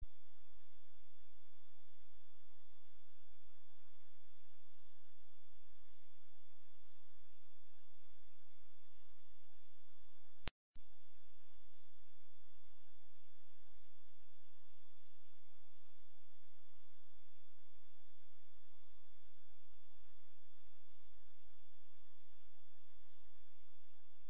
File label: Dog Whistle